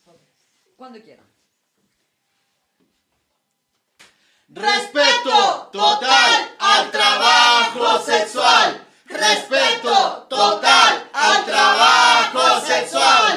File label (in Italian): coro